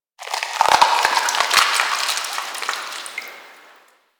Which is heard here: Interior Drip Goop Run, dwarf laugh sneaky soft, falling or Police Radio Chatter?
Interior Drip Goop Run